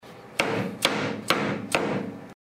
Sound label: rub mlt wood new; rubber mallet